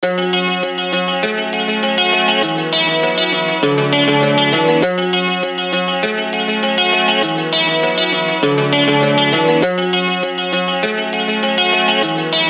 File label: Guitar